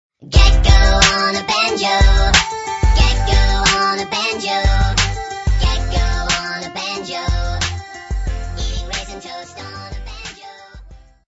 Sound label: Banjo